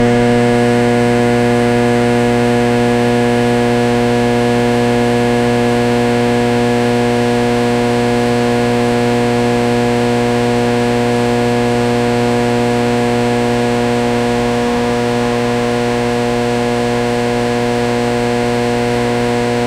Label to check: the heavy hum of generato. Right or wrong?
Right